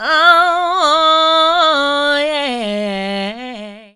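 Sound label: oh yeah, female vocals, left; PlatinumPhattCD2; E-MU Producer Series Vol. 8 – Platinum Phatt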